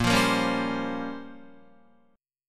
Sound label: Bb Minor 6th Add 9th Bb down Guitar; strummed; JGuitar